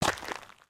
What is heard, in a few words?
Foot Dirt Sneaker Walk, footsteps